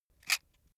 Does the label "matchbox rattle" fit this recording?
Yes